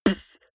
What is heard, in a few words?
beatbox snare punchy damped